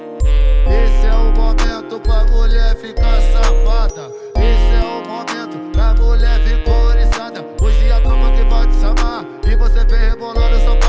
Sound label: music